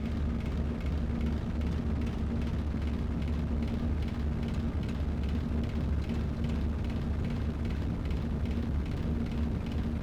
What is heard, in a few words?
Boss Machine Loop; helltaker